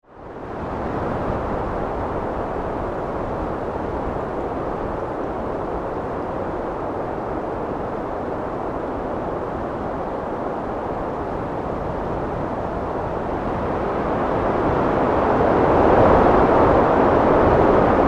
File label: Wind Storm Heavy, Gusts; Weather; Sound Effects:Weather; BlastWave